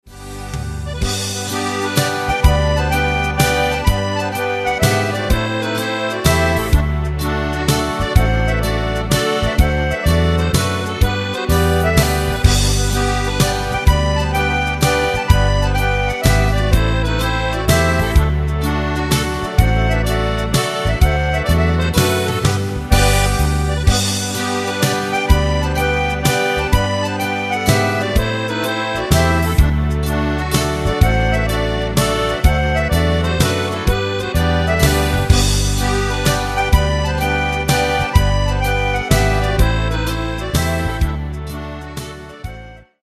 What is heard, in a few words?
tangrings hambo